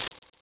Chain Move Land; The Chain landing on the ground; Sheik; Zelda

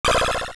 Menu Erase, Erasing a saved game; AOL

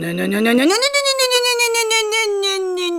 Struggle; Oren (Zora Queen) struggling in her pool; zelda

